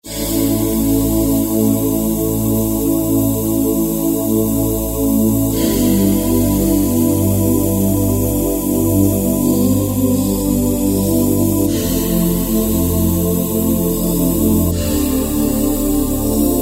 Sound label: Fair Breath, Kurzweil K2000; Kurzweil K2000 V3; Factory Programs